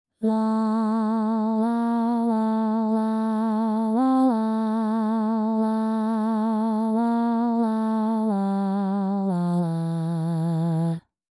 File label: Tenor